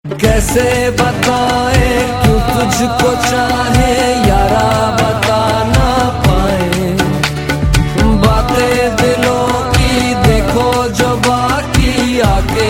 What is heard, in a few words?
Best Sad Tone